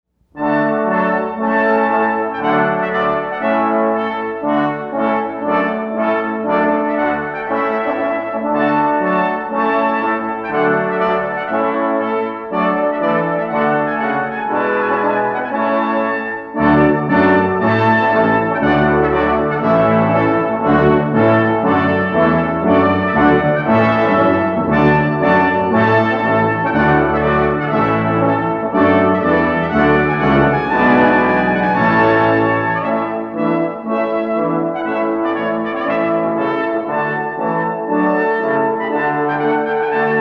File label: Trumpet Tune Brass Ensemble